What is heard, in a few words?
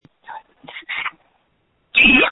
screams